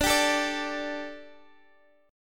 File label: D Suspended 4th D, Guitar, Open G